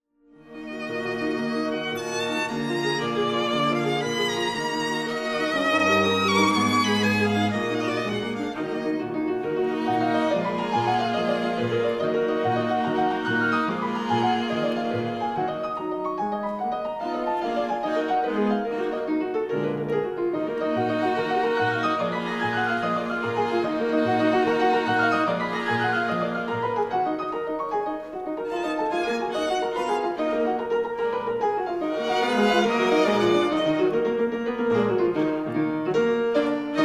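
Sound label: Fortepiannyj kvintet mi bemol' mazhor, piano quintet in E flat major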